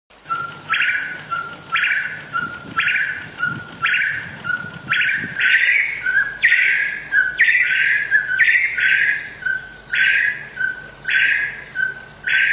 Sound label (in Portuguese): Canto do perdiz de cabeca carmim